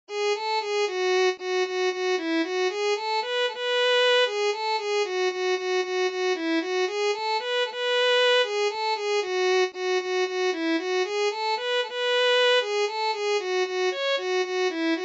Violin

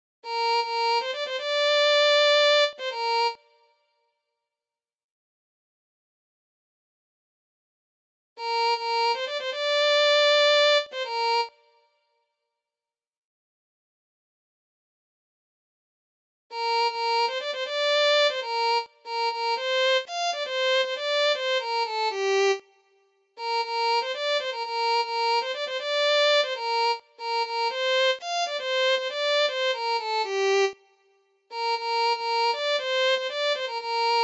Violin

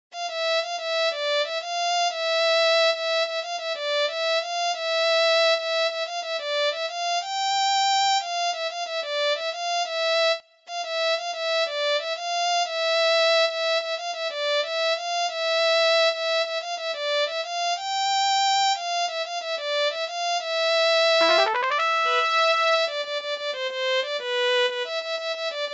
Violin

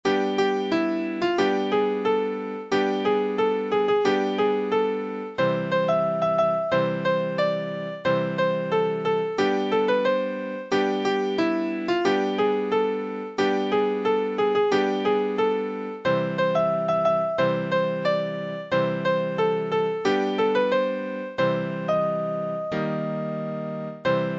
Piano

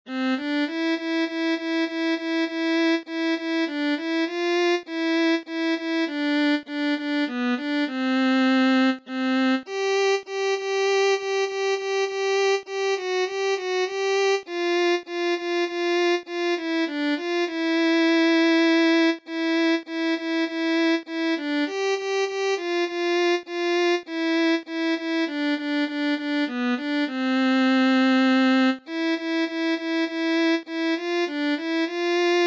Indian National Anthem Violin

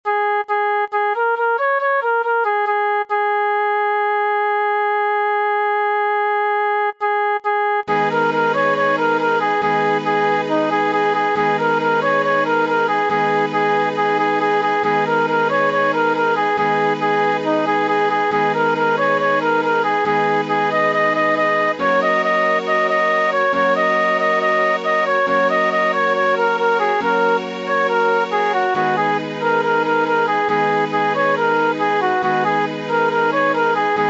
Flute

